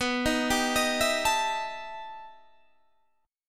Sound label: E Minor Major 11th B, guitar